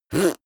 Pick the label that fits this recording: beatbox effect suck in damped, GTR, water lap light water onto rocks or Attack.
beatbox effect suck in damped